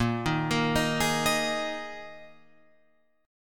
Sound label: Bb 7th Suspended 4th Bb Guitar Open F; arpeggiated; JGuitar